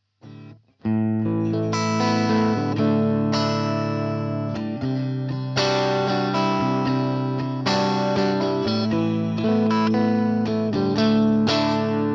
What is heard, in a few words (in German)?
strat britisch; Class 5